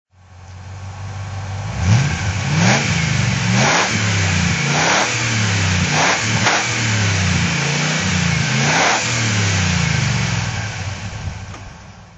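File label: morgan 8 original exhaust throttle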